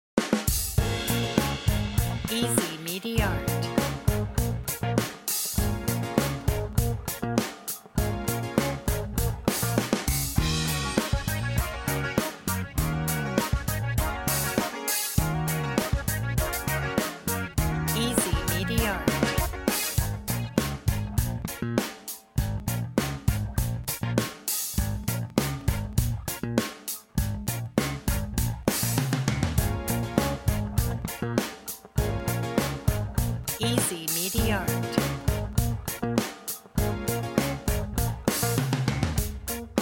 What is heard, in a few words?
funky; frog